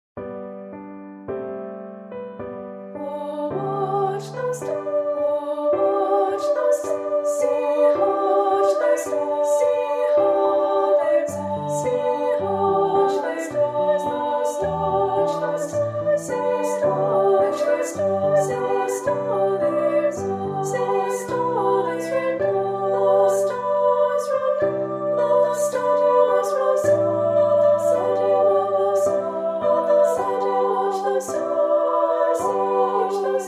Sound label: With Piano Unison; O Watch The Stars